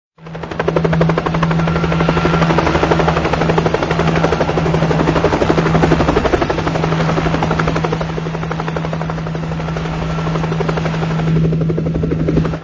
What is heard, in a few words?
3D helicopter